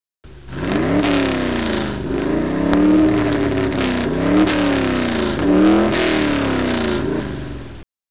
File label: racecar